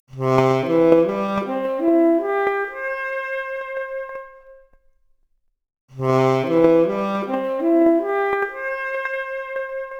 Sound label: Saxophone arp, mp Stereo output noised, 4bit